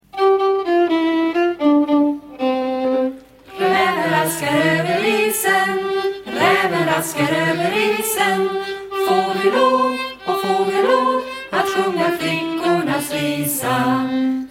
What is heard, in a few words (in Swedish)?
musik